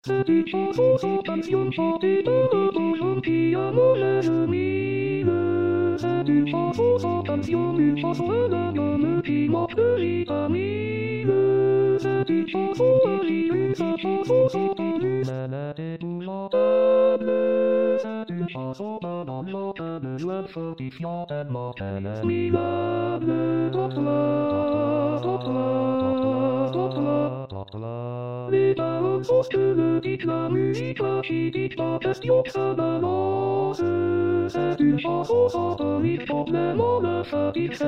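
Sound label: soprano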